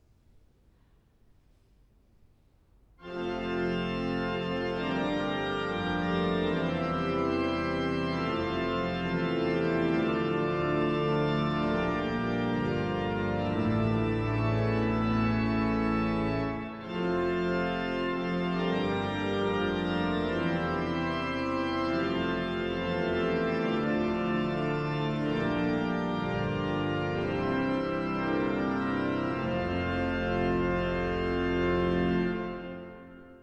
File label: Fanfare; svg dk